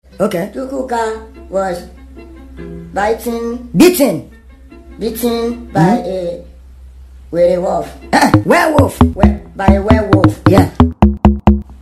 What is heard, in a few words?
Chukuka Cruise Beat